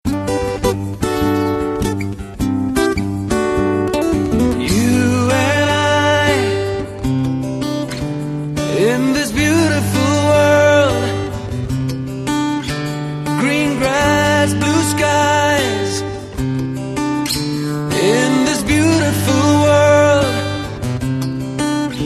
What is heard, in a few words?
GUITAR RINGTONE